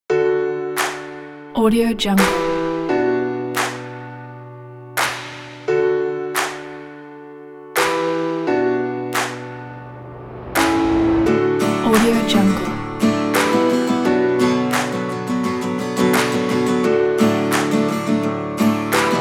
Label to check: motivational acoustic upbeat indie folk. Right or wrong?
Right